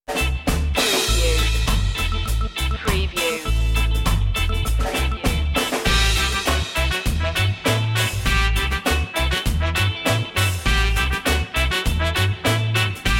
rock steady rockin

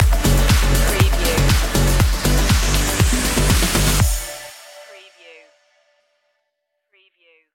sax me up stinger

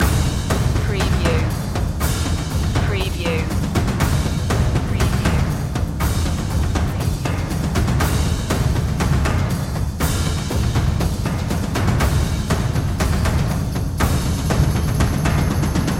percussion loop

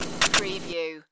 digital data glitch

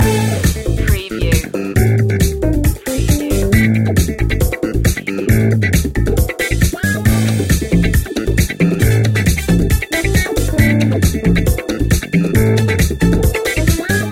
afro disco funk loop

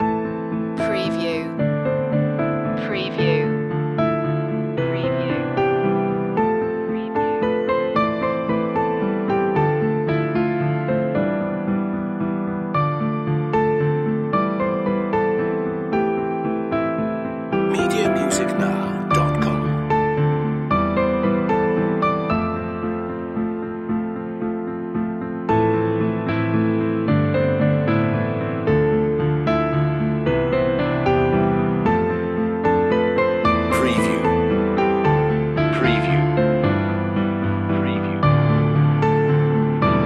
a little motivational piano only

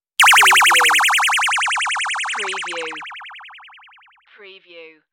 Ringer

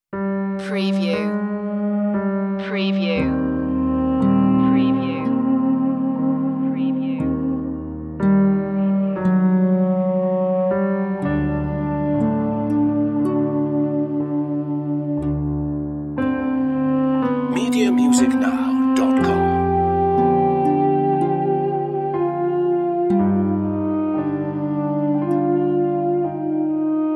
sad and black

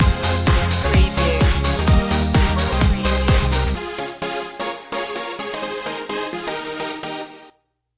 turn up the music stinger

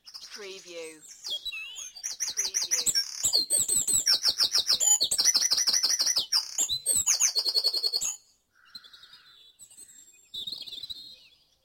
Dawn Chorus in Mold North Wales - Short Loop; beanstalk audio

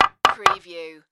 Hammer Wood Shim

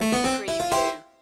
Casino Slot Machine Win Fanfare

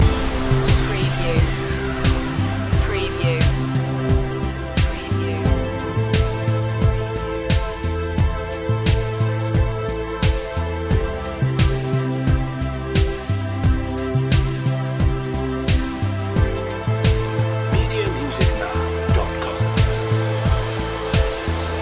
falling blossom loop

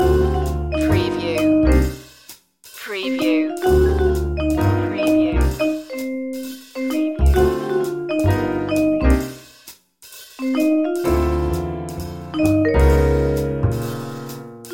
fresh jazz loop

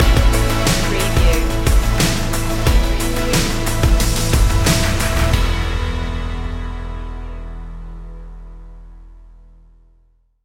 spread your wings stinger

